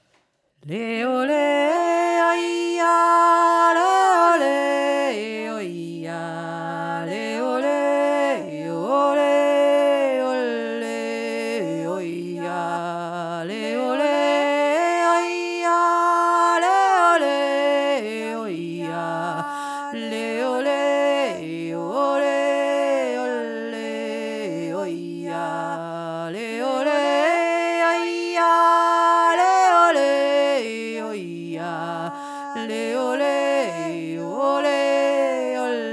sonnenyok hauptstimme

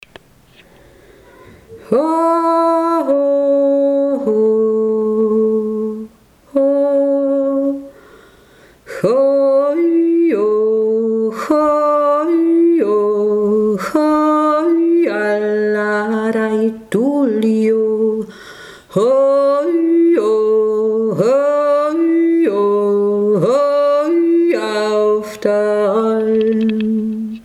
mittlere stimme ho i o

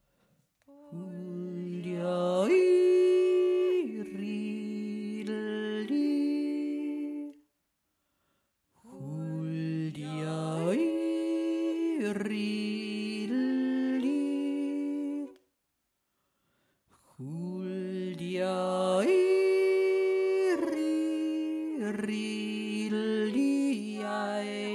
gusswerker tiefe stimme, jodlerei

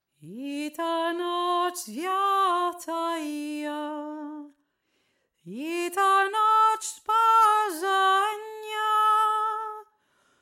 eta noch russisches weihnachtslied tiefere stimme; die zweite Stimme

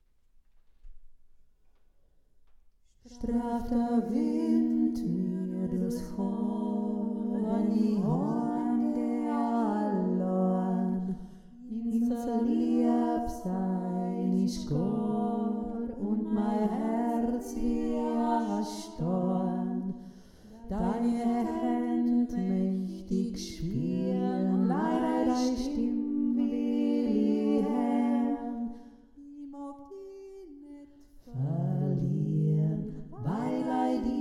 mittlere und tiefe stimme

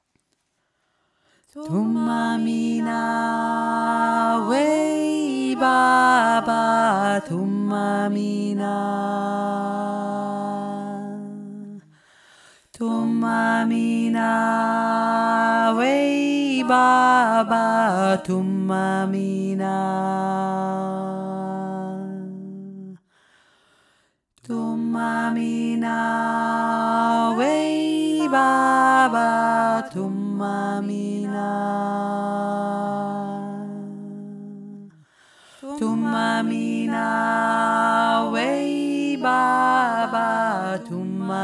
thuma mina tiefe stimme

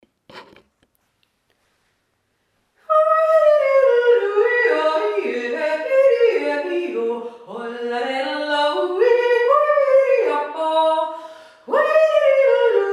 tiroler jodler langsam

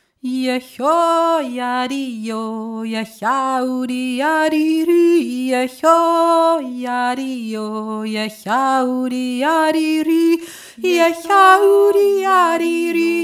der von di ondern 1 stimme

